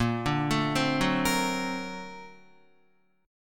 Bb Augmented Major 9th Bb down Guitar; 4E,4A,5D,5G,5C,6F; Bb+M9 arpeggiated; JGuitar

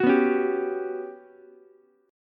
G Major 7th Flat 5th, Ukulele; GM7b5 strummed

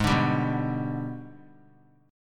Ab Minor Major 7th Flat 5th, down; AbmM7b5; strummed

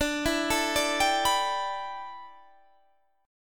A 9th Suspended 4th, D

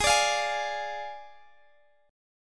F Minor 6th Ab

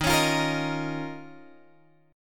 Eb 7th Suspended 2nd Eb down Guitar Open F; Eb7sus2 strummed; JGuitar

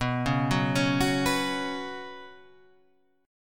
B Suspended 2nd chord; arpeggiated; JGuitar

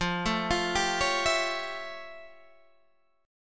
F Augmented Major 9th, Guitar, Half Step Down

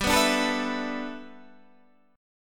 G 6th Add 9th G Guitar Standard, chord; 15,14,12,14,12,12; G6add9 strummed; capo=2

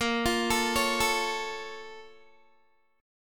A sharp Minor Major 7th, down, Guitar; A#mM7 arpeggiated

